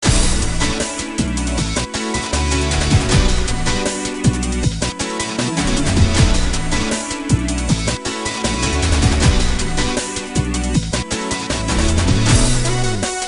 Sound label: Pops Bm BPM157